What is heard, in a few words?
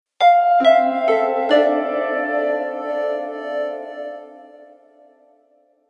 Nokia 8800 Piano